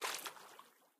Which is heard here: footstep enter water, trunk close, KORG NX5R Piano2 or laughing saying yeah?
footstep enter water